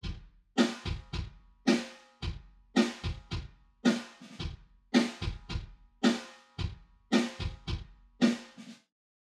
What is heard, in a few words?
kick snare wet